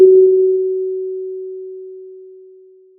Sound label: Sound of a church bell